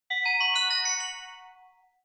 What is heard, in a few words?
note block sound